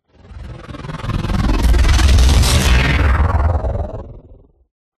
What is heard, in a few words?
fully charged energy electrical robot riser droid power bright; downfilter future; Alexander Gastrell